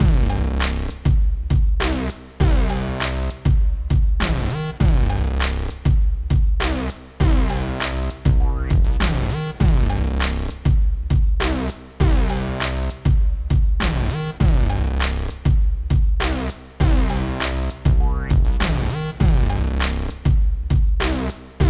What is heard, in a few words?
Beat Loop 4 4 120bpm